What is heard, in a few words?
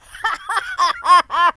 laugh